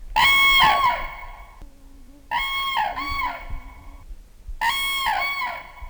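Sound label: common crane voice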